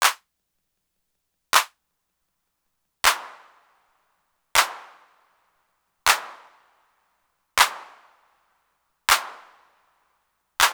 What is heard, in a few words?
TR-808 Hand Clap; Roland; Instrument samples